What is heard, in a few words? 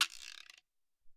Guira Lng